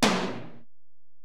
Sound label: Tom, Alesis DM-PRO